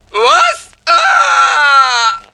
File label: death; awesomenauts